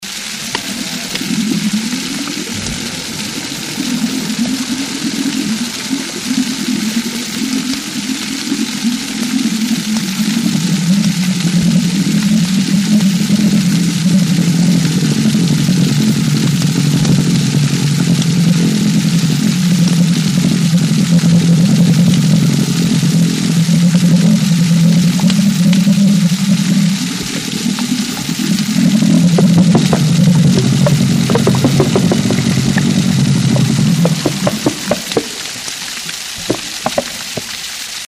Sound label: Outboard Motor 2 cycle